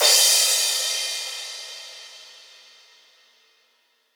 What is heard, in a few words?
crush